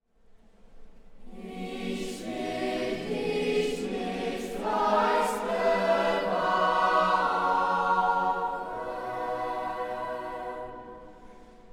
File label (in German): knabenchor orgel; knabenchor unser lieben frauen bremen; johann sebastian